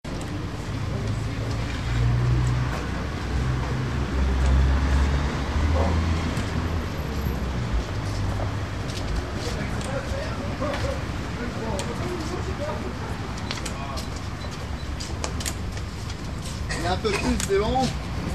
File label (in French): fruits et legumes